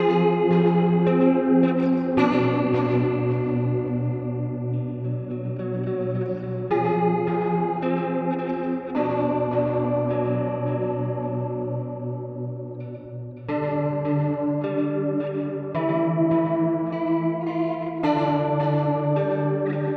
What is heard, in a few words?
Delay Reverb Modulation; UAFX